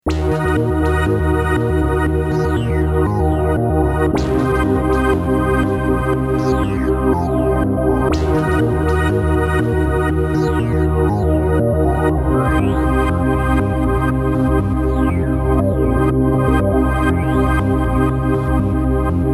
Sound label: bend n mod me; fantom xa